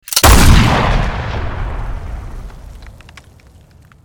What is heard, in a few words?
battle cannon